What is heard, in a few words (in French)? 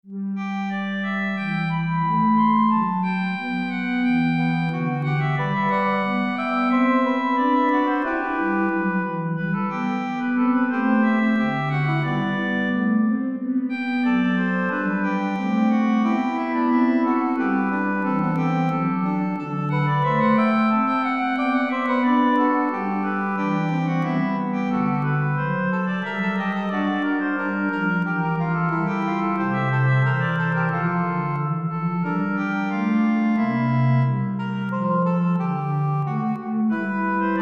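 livre d'orgue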